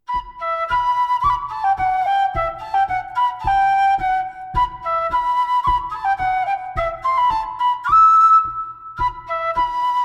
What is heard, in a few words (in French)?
Vieil air d Auvergne